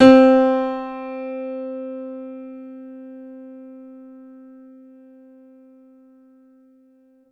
Piano Grand c3 hard

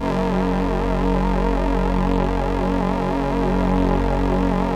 LAMBDA, String Machines

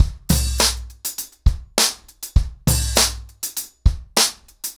100BPM, ACOUSTIC DRUMS

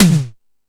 Fusion Stix, E-MU Pro-Cussion; drum machine

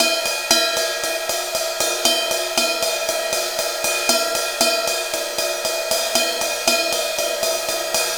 Ride Cymbal Pattern; Essential Percussion; Ride Cymbal Patterns

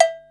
chacha, Yamaha Motif ES drum machine; 300 Drum Machines; Yamaha Motif ES-Series

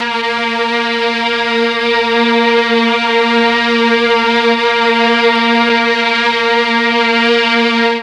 A#1 16 VIOLI, Mellotron; TRON 16VLNS; Mike Pinder - Mellotron Archive CD

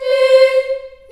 C4, Choir, CHILD; CHILD IHS

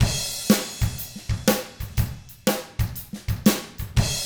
SHUFFLER, Drums; ILIO; Double Platinum Drums 1; 122 SHUFFLER

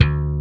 SLAP BASS D1; E-MU Formula 4000 Series Vol. 5; Protozoa; Proteus1 Presets

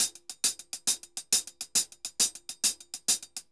HH, Festa Latina, Drums & Percussion; Best Service; Festa Latina VOL-1

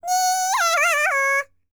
BLACK SEA, World Voices; ILIO; Vocal Planet VOL-5

